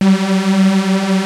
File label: TECH KIT, RAVE